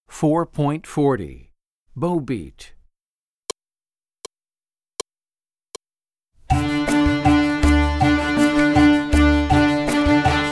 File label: Bow Beat